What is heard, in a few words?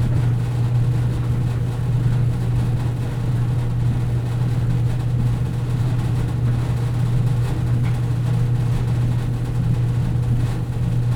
Chemical Dissolution Chamber; Mekanism tilera Edition